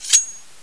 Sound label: draw, knife; weapons; csgo